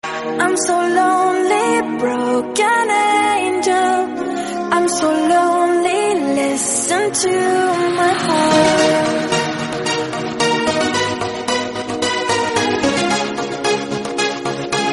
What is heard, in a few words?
English Song Ringtone